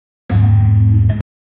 sound for youtube shorts, marine

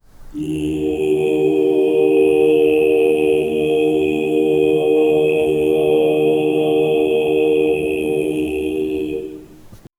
chant the sanskrit mantra

